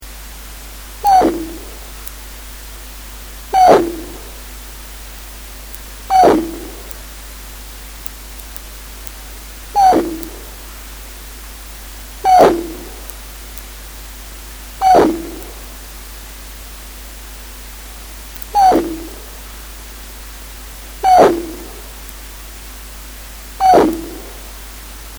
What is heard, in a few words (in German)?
Abendsegler Balzruf verlangsamt